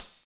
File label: Ride; Equipped Music; Loungin House